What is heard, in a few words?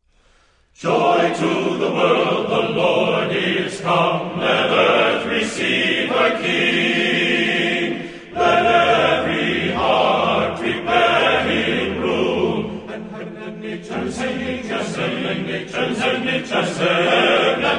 joy to the world us army chorus